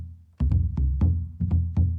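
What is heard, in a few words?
Calm Far, music; from dust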